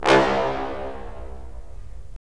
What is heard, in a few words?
BRS, Roland LA Composer